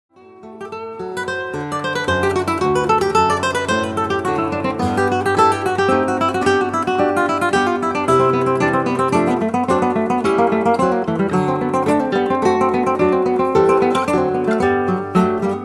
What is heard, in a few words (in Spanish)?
Falseta, Flamenco